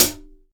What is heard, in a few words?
Closed Hats